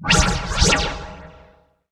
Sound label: boss umbra teleport